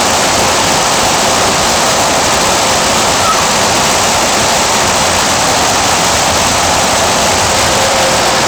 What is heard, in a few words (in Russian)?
fontan